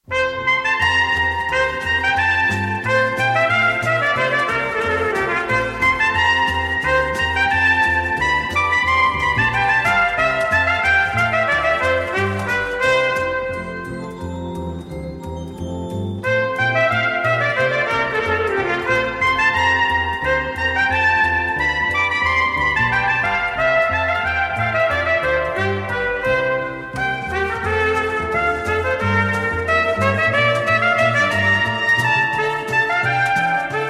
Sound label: Orchestral Suite in D Major, Gavotte; Bach, JS; Arr. Defaye; BWV 1068